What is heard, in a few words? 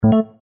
FAILURE; Sony Ericsson W380i